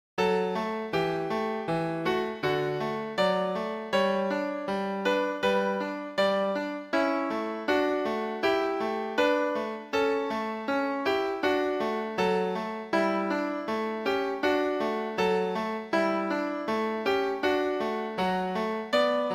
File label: FolkSong; Mendelssohn